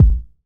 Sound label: Kick, Kickdrum; Equipped Music; Loungin House